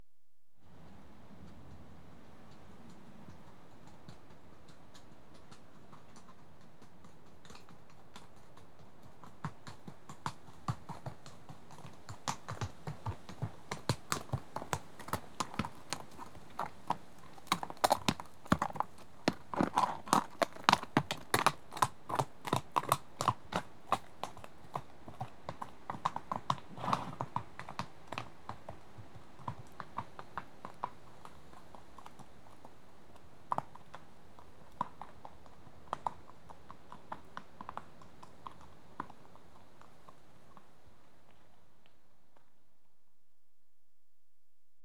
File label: One horse approaches at walk; Horses; BBC Sound Effects Library